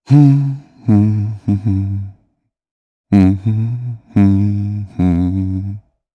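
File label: Hum, Japanese; heroes; Shakmeh; kingsraid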